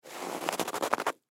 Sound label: Snow Footstep Single; Weather; Snow Sound Effects; Part of Snow (Sound Effects:Weather); Blastwave